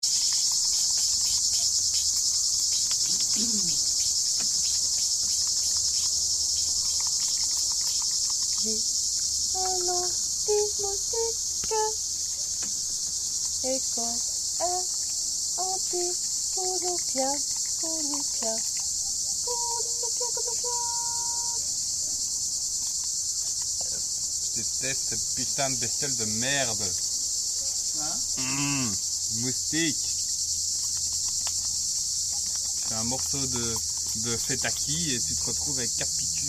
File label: grece koroni kounoupia